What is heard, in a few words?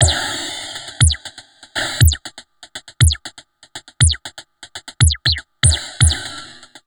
Drum Loops Crazy Processed